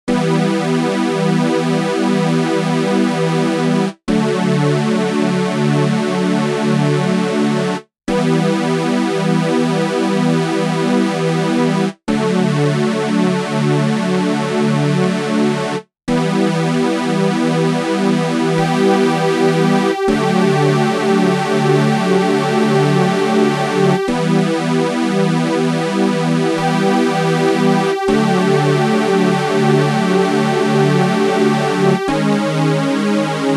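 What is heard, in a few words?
Pad Sequence, dry wet; SYN 99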